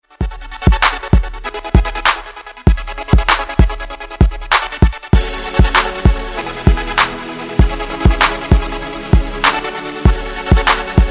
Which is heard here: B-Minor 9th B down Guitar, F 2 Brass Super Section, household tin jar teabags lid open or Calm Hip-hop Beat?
Calm Hip-hop Beat